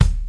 short kick one shot d key; Duration 0:01

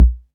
dry kick drum b key

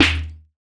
tight lows steel snare drum sound d sharp key

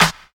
studio snare one shot E key

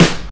clean acoustic snare sample e key

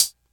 dampened foot cymbal D key; hits one shots; hat samples

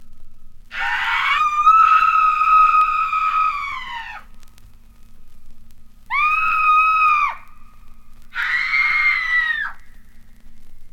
three screams - female

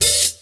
prominent open high hat sound a key

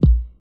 smooth bottom end kick one shot a sharp key; kick drum samples

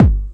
clean kickdrum sample g key; Duration 0:01; Samplerate 44100 Hz; Mono

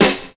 fresh raw snare sample g key, tuned drums g key

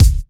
silky kick single hit F sharp key; kick drum samples

